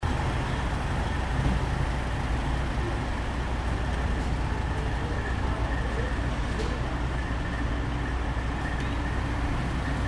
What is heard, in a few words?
Town Traffic